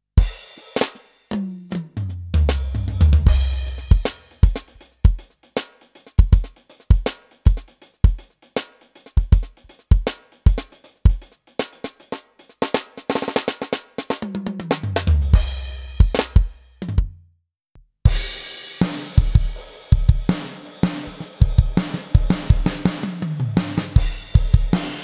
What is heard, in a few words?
Test Alesis DM10 X Mesh Drums